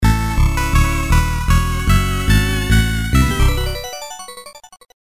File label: opening fanfare; sho jo ji